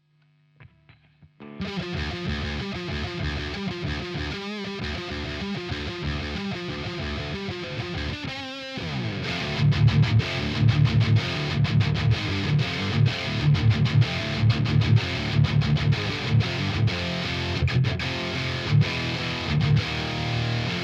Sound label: vintage hi sat, mesa boogie